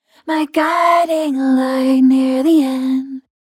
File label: Vocals ROTARY CLS 222 Dual Speeds